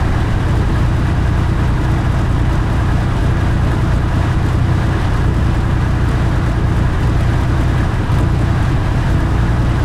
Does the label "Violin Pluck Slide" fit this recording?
No